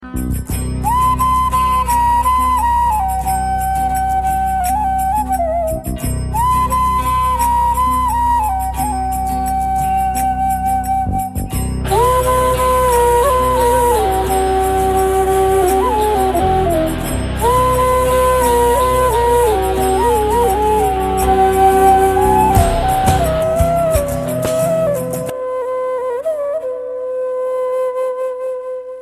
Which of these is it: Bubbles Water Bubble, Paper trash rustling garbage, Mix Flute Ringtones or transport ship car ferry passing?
Mix Flute Ringtones